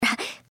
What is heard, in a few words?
breath; pony; Twilight Sparkle